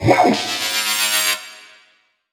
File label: Alien FX; Sonic Foundry (Sony Creative Software); Underground UK House & Electro